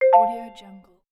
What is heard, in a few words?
completed musical alert; رابط کاربری عملیات موفق; interface sounds